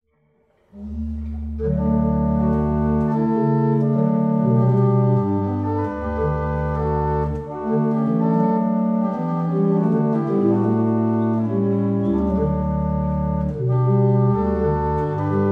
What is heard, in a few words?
Easter Hymn, barrel organs; Staunton